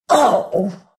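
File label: pain, Russian; dota2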